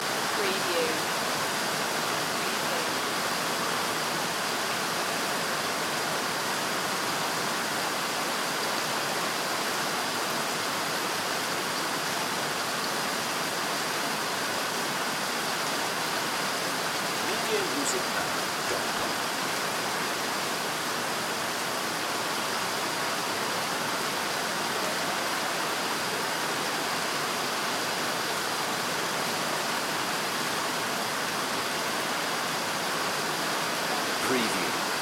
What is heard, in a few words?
heavy city rain loop